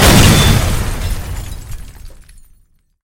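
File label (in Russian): серьёзная автомобильная авария с разбитыми фарами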